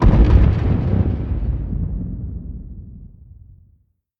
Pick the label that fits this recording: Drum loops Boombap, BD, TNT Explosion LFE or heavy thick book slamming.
TNT Explosion LFE